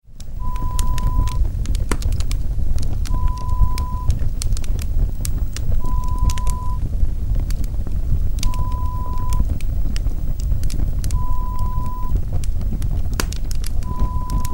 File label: Flame sound